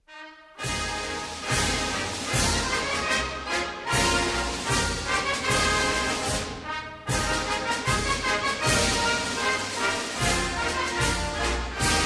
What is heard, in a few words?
Instrumental